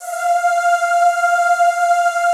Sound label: ZZZ F4, Classical Choir; Best Service; Extended Classical Choir